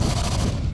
queen run, monster

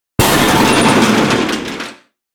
gate death

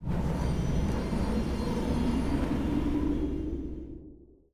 idle, monster; elimental; lake

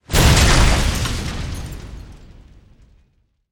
trainroof death, monster

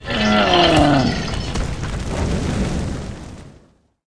mummy dead, monster

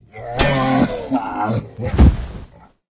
orge dead, monster

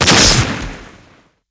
skill explo

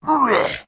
minotaurus damage, monster

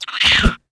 chimpanzee attack

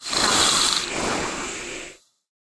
snake dead, monster

